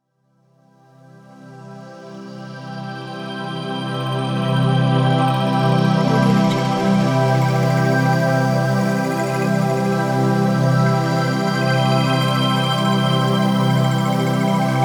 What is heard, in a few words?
mars sunrise